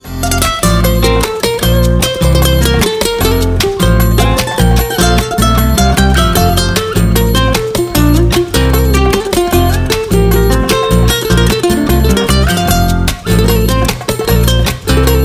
Tinkle; Tecno Spark 7; ringtones

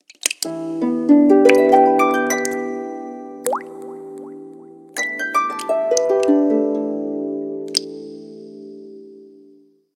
Arp Harp; Coolpad 1827; alarms

